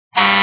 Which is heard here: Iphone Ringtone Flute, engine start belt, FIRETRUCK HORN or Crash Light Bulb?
FIRETRUCK HORN